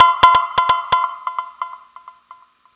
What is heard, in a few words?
Goatbell, Percussion